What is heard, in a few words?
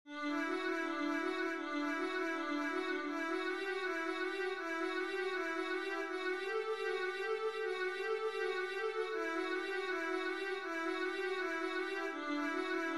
vla etude, viola